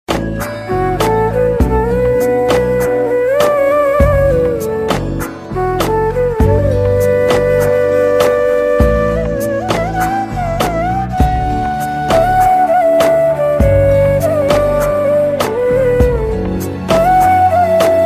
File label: flute instrumental ringtone sad ringtone